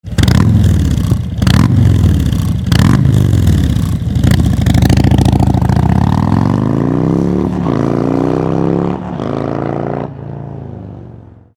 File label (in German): HD Touring CVO Road Glide 117 Klappe geöffnet; REMUS